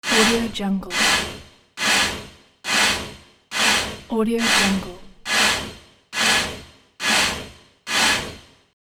steampunk cartoon animation machine movement industry